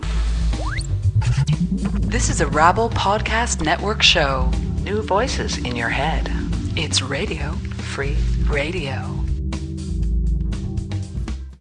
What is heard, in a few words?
radio intro